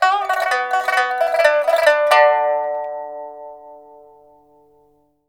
PIPA PHRASES; Spectrasonics; Heart of Asia

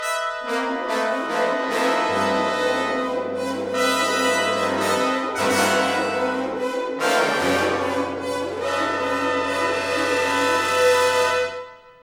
CR FANFA; Roland - LA Composer vol. 2; FloodSet

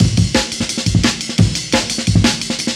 DNB BREAK; m8 breaks

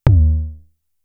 Tom, Toms; Fricke Schlagzwerg; Drum Machines

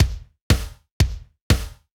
Beat Kick Snare, 120bpm, House; Old Skool House; House of Amnesia; Big Fish Audio; OSH Construction Kits

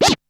Perc; ZERO G - Datafile DISC 2

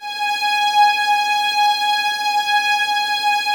VNS G#5, Violins, String Ensembles; Miroslav Vitous

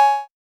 Roland Juno D Perc Menu Kit

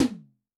Hybrid H, Tom, Yamaha DTXpress-II

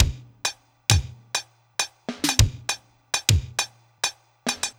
Beats, Synchro Funk; Coop; Sonic Foundry (Sony Creative Software)

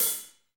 HH, Kit s; Real-Kit s

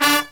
HIGH HIT

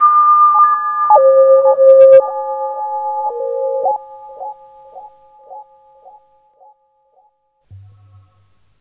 Spectral Tones; Sonic Foundry (Sony Creative Software); Loop Noir

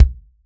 REAL DRUMS POP KIT; Best Service ProSamples vol.43 - Real Drum Kits

